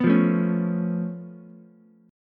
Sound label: C sharp Augmented 7th, C sharp, down, Ukulele; strummed; C#+7; JGuitar